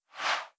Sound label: UI FX BG Scroll; blue archive